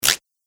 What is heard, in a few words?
Arcade Game Fast Wind Up Sound, retro; video game; Arcade Game Sound Effect - Retro Inspired Sound Effects - Think Nintendo, Atari, C64